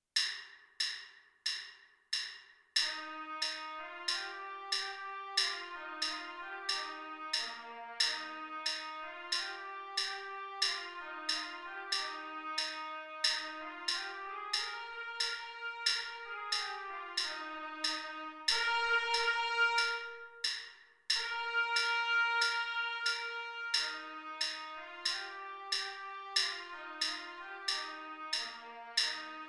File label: trumpet